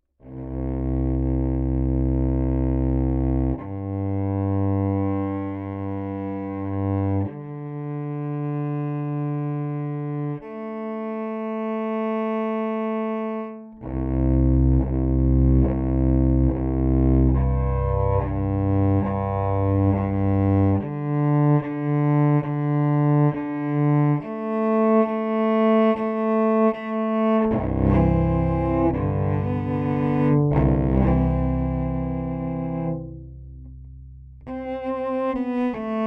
Cello Pickup Baggs EQ to taste

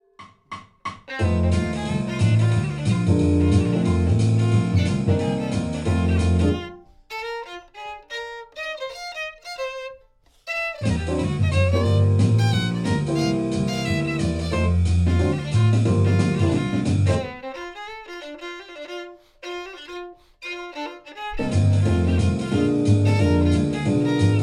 C414 with Remic leakage at 100dB

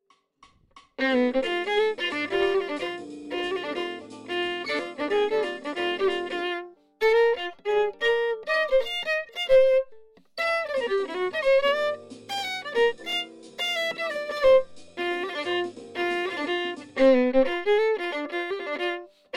Remic leakage at 100dB

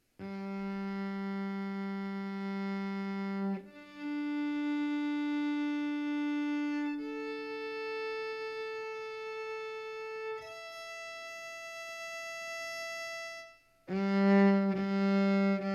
Amp Countryman DI

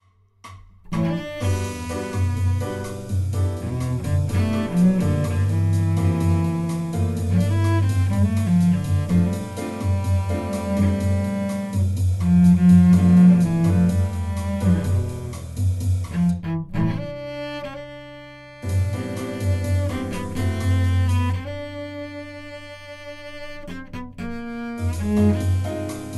DPA 4099 leakage at 100dB

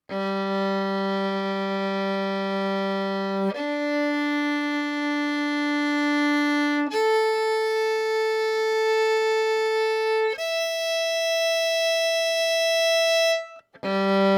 Vln DPA Yamaha Pickup Blended

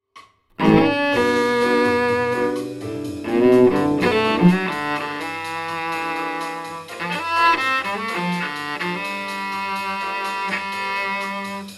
Remic leakage at 100dB

